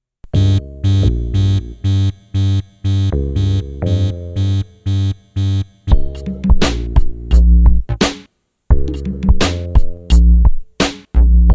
Wake up Ghost, Urban and Rap

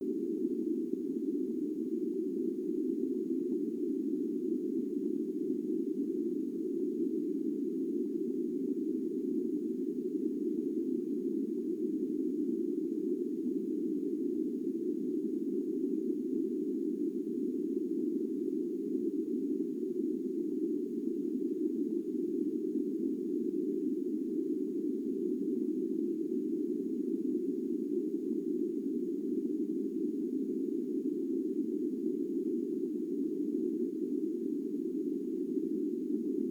Low Hum, Shortwave

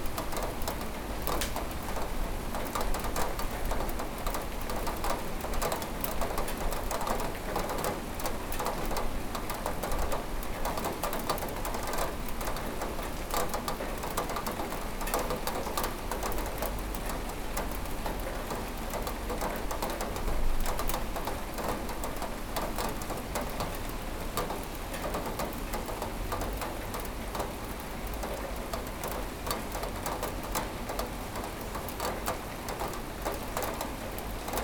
rain window; 99Sounds; Rain And Thunder

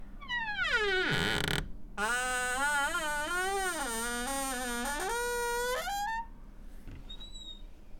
Low Squeak, Door; FXHome; Squeaking

